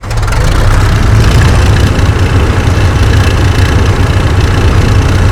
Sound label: tank engine